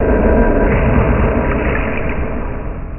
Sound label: CHUNNEL